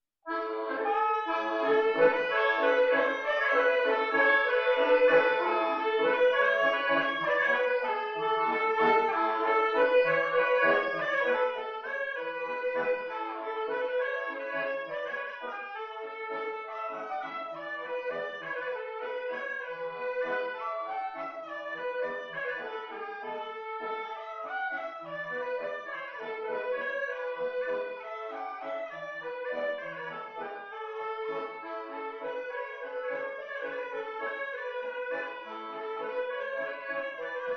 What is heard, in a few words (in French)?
bourrée montagnarde